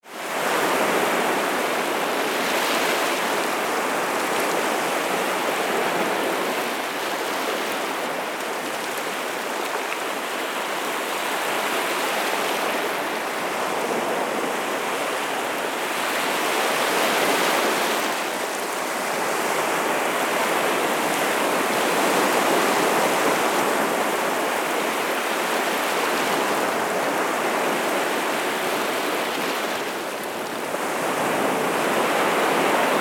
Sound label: Waves